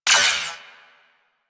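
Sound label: submachine gun Shot; Warframe; Tenno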